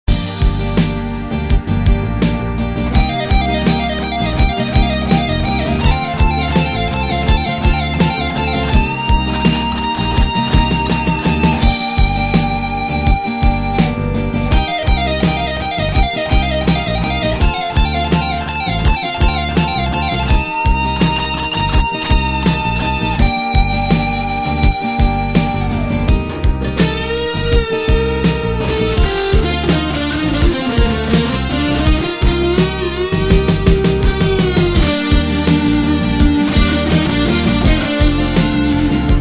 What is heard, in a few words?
guitar